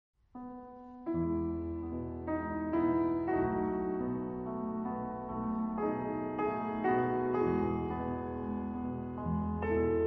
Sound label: Polonaise in E major; Polonaise No.3 in E major, Op.10-3 “Chanson de L’adieu”